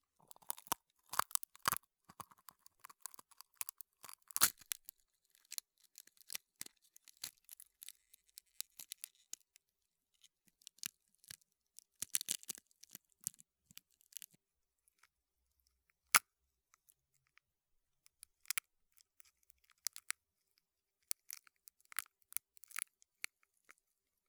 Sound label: Egg Shells